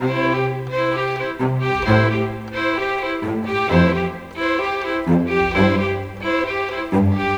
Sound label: Cinematic Strings, Orchestral